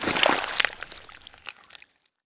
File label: squeeze, headcrab gland; creatures; headcrab classic